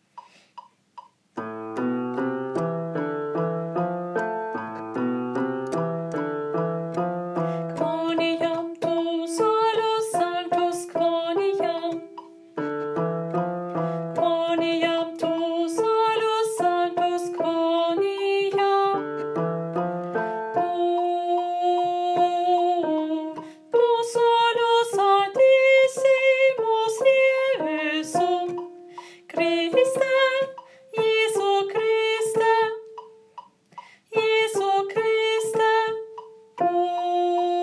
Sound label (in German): Gloria, Alt I; Alt I (Teil 3); Messe; Chor